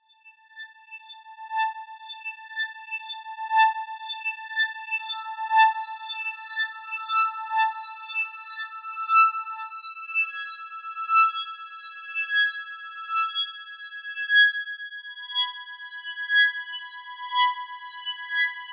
all combinations of 4 groups of 100 sawtooth waves; inevitable music 4